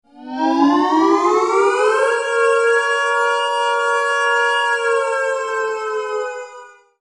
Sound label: Hurricane Centre Alarm System